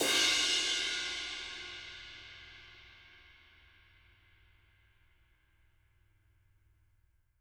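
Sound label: dark crash, Living Drums, JZ CRASHES; Sampleheads; Peter Erskine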